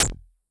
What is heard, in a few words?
splat